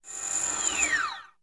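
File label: Fast Whine Down Cartoon, servos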